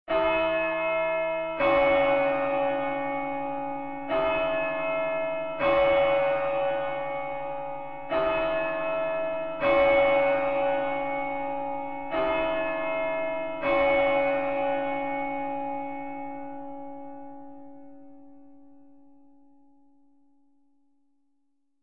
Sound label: Bells